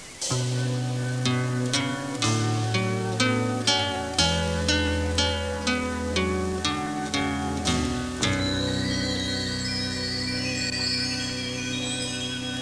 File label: mellow